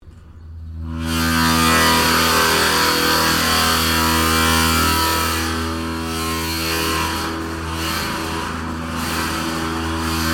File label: Carousel metal resonant vibrations, Playgorund carousel malfunctioning; Amusement Parks